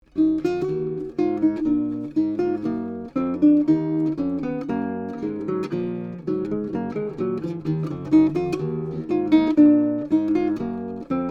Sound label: Bouree, Baroque Guitars; Mauchant; Mini K47 mics into a Trident 88 recording console, using Metric Halo ULN-8 converters; Roswell Pro Audio